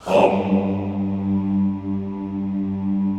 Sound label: HOMMM G1 L, Extended Classical Choir; Best Service